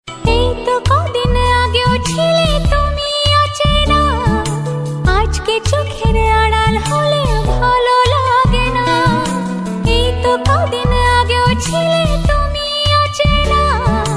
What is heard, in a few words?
Bengali Ringtone